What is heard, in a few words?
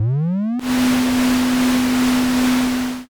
vacuum